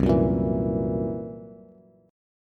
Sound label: C 7th Flat 5th C down Bass; C7b5 strummed; JGuitar